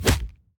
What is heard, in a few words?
default punch